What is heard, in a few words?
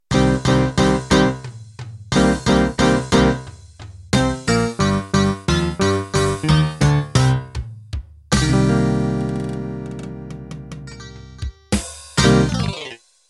Rockabilly End